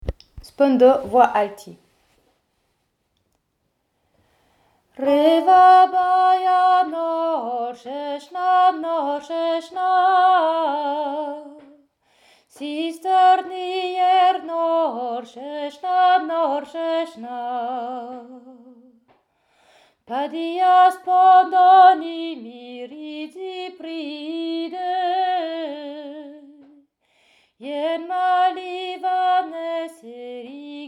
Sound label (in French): voix alti; Spondo